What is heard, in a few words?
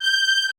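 violin quartet F G5; AKAI Advance Orchestra Upgrade 97 Vol.1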